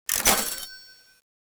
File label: Store Buy